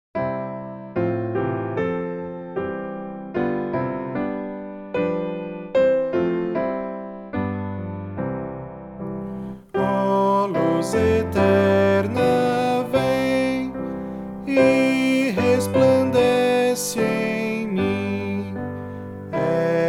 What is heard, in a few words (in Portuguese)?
voz piano